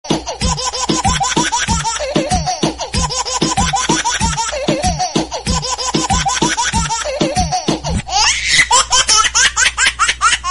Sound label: he he ha ha, bgm, sound effect